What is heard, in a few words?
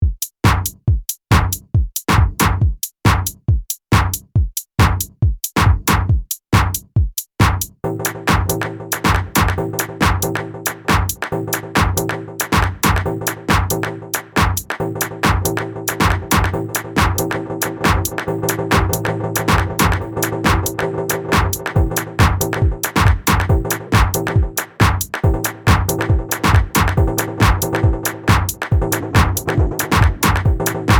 dub